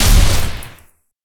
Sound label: Sci-Fi Weapons-Sniper Rifle Single Shot